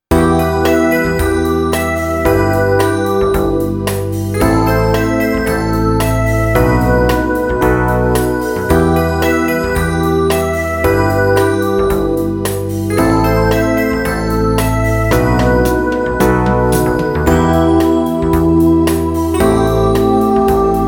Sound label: Rock Ballad Classic Theme Synth Band in Em 112 bpm